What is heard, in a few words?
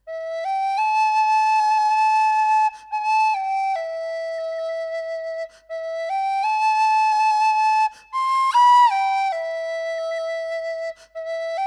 whistle C rosewood